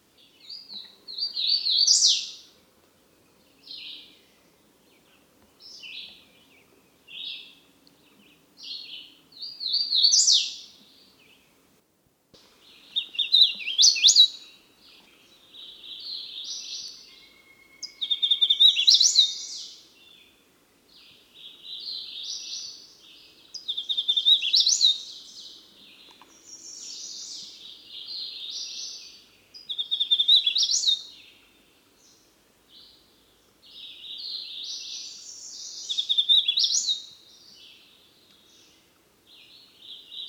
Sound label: Warbler Hooded